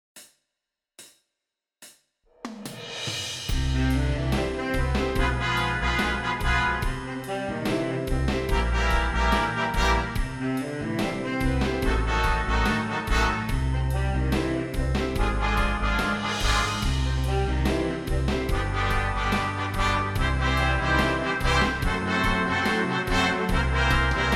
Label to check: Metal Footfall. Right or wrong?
Wrong